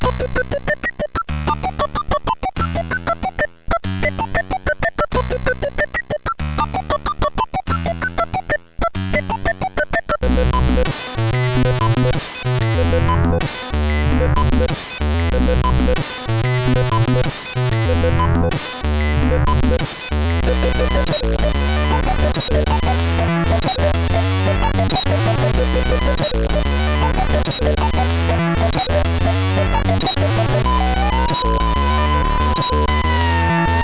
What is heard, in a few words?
Feed a Bird, sid CSG8580R5